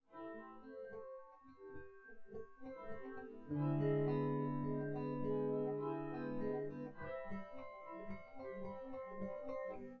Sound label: no drums synth conv 5cm sim; SASSEC07